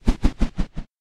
Axe; Wartrooper; peace death 2017 pc

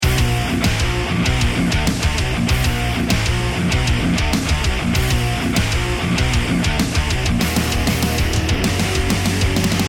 start round

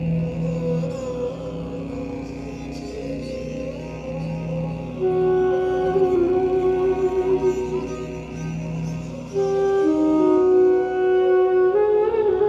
Prayer Drone; delta force black hawk down 2002

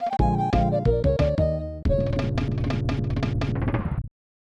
Lose Life (Underwater); Donkey Kong Country 3; SNES

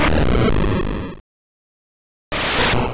Explosion; Pokemon; Gen 1; attack moves; RBY

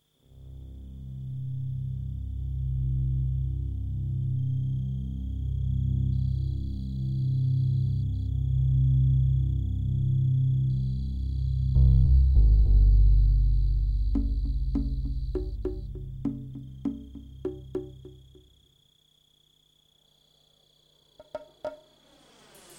forest ambience; Worms; PSX

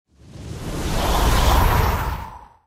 Tornado Start

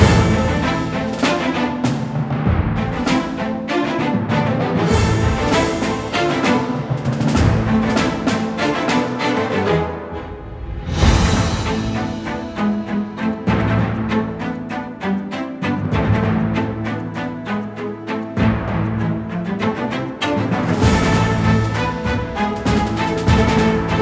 Bugle; honkai impact 3rd alive original soundtrack

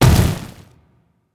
Stone Kick; helltaker

